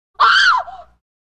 Woman Scream

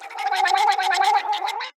Mouth - Noise end; Ed Edd n Eddy; Adromulus Pack; EEnE

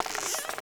Stretch; Ed Edd n Eddy; Adromulus Pack

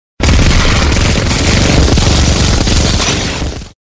minigun, weapons

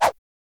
Whoosh; Ed Edd n Eddy; Adromulus Pack; EEnE

